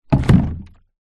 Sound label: industrial cannister small plastic liquid down hard ground; zapsplat